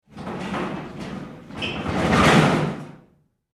Impact Metal Movement Large; Materials

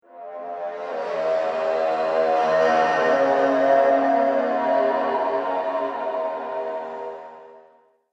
Horror Dark And Resonant Noises; Scary Soundscape Sound Effects

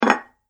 Medium Sized Dinner Plate Placed On Table; Dishware Sound Effects; Household Sound Effect

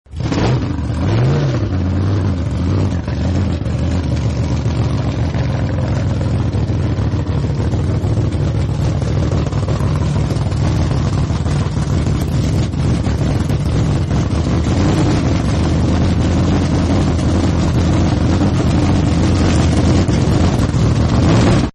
Hot Rod Startup and Idle, Cars; Vehicles; Partners In Rhyme

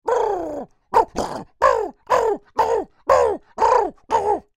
Creature Oodle Bird Grunts Angry; Birds; Animals

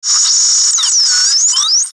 static high; Static High Frequency Sweep

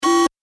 Gameshow Buzz In, electronic; Miscellaneous Electronic Sound Effects

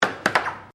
AIR HOCKEY HIT TRIPLE; Air Hockey table; Games

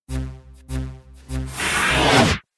Radio Stinger

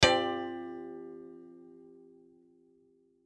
Violin Tap String; Ring Out; Strings; Blastwave

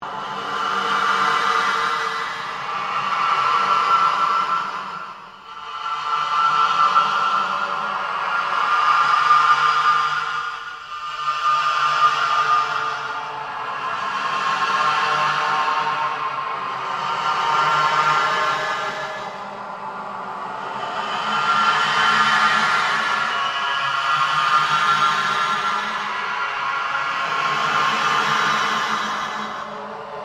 Ambient Drone Ghost Chatter

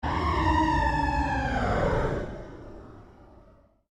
Created Cyber Whale Call, Alien Sound Effects

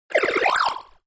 Electric Bubble Blip, Rollover or button sound; Beep

